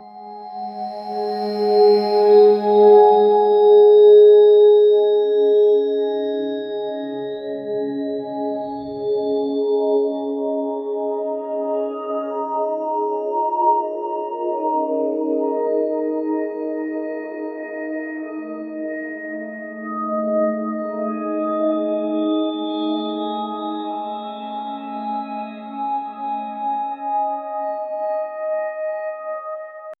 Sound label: the sound of evocative; moody